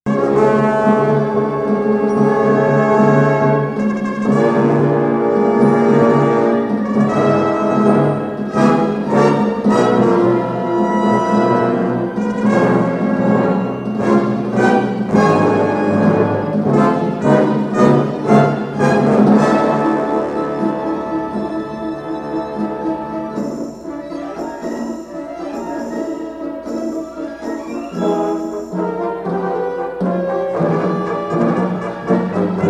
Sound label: orch